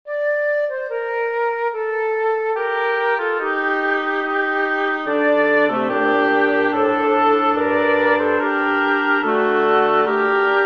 for woodwind quartet; cherubini luigi; graduale from the requiem minor mvt

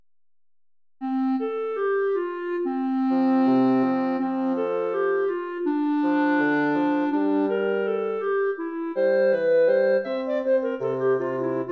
jingle bells; pierpont james; the one horse open sleigh

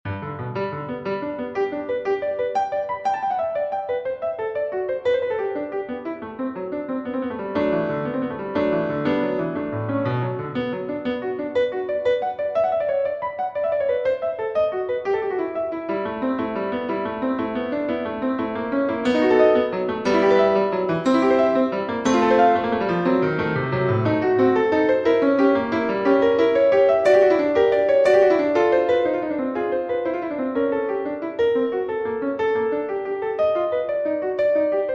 gigue for piano; saint saens camille

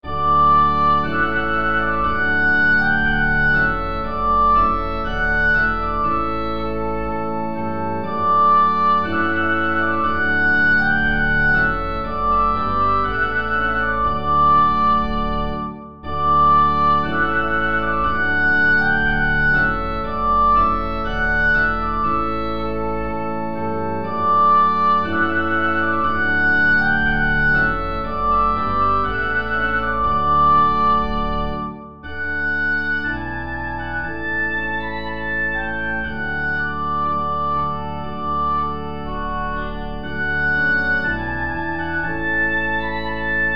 trumpet voluntary; clarke jeremiah

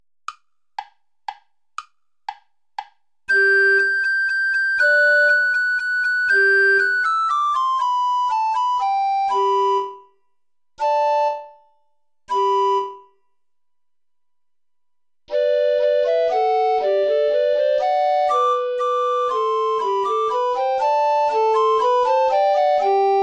play along without soprano recorder; alter ungarischer tanz

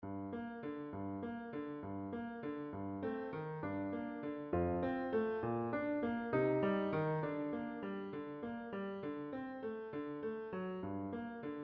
venetian boat song; mendelssohn bartholdy felix

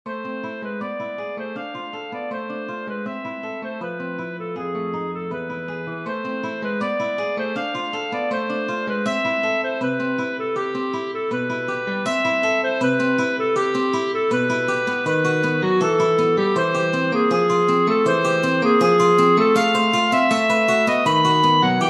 allegro agitato arranged for clarinet; mendelssohn bartholdy felix; from lieder ohne worte

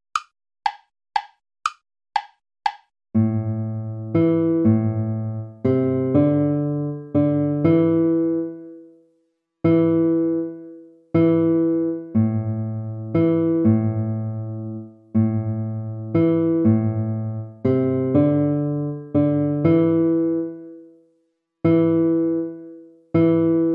baroque minuet minor play along

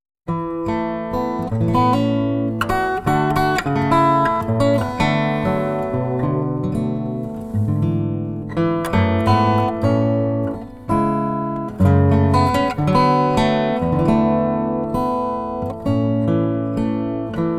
air

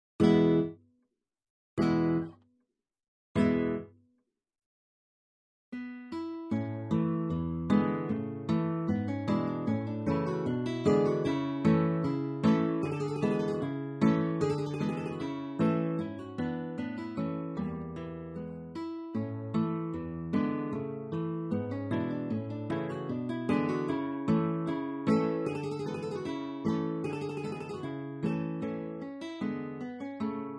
gavotte; jacobs walter; mignon

